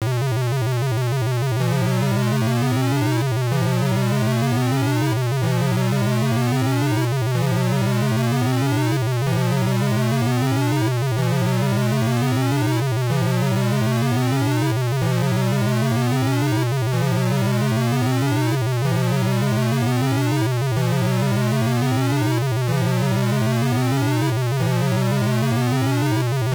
Alarm; Ristar the Shooting Star; Game Gear

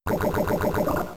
Sludge Bomb; Sludge Bomb part 2; pokemon; gen 3; attack moves

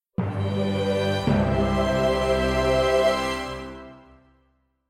sting sculpt masterpiece; The Sims 3; Windows

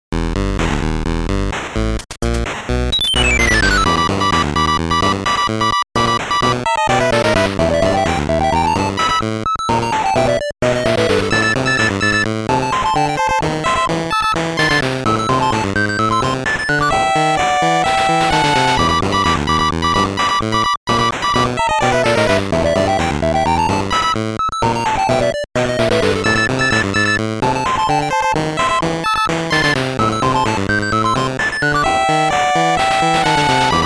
Boss Battle (8-Bit); ducktales remastered soundtrack

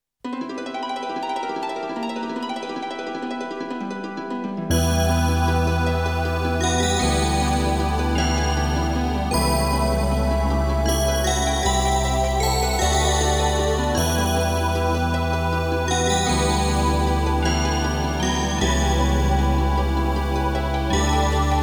Harp; Sorcerian Forever II

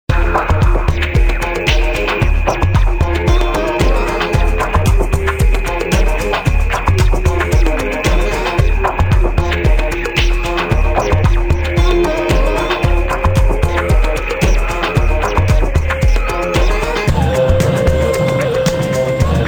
Music Bar

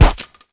WALTHER

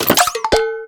confirm; Garfield's Diner; Android game music

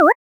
SFX Jump Student; life is a game android

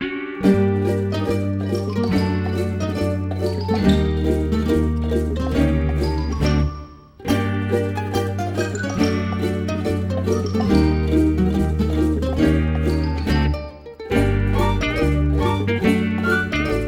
Ui Music

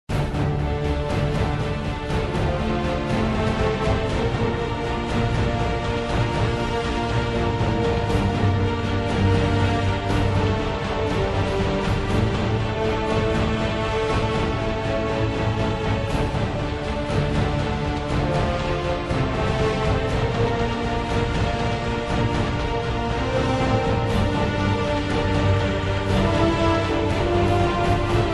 Fight

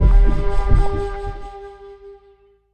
mini splash

